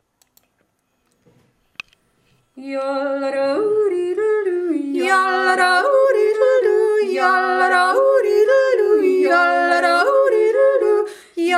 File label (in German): zweistimmig